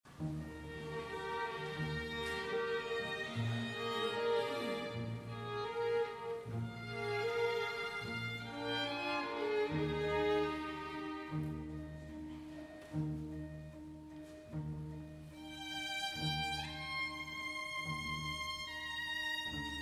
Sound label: Agnus Dei Solo